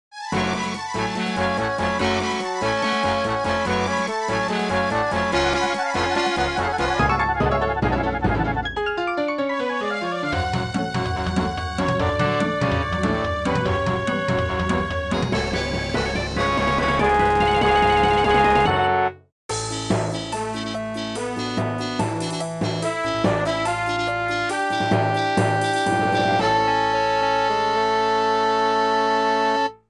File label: Victory; Kaboomberg